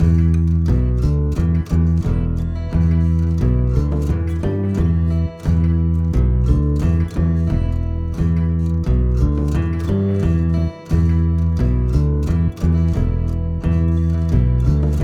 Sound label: drumulus singalongus B